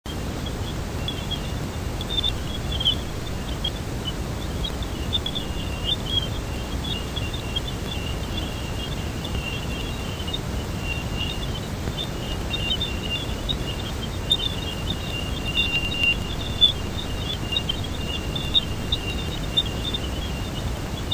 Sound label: Jupiter, امواج رادیویی سیاره مشتری